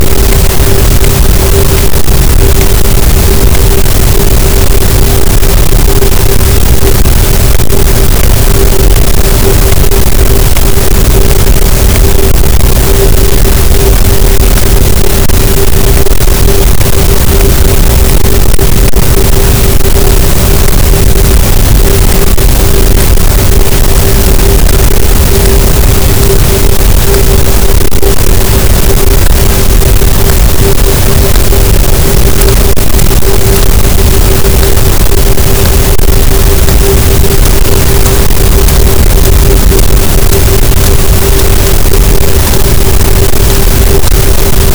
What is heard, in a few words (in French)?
cachalot, Physeter Macrocephalus, gaussian noise